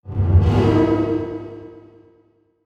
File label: Transition Metallic Blast; Production Element; Title Transition; AdobeCC